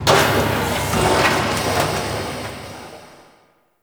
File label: clunky machine; Warframe; Corpus Railjack; Ambience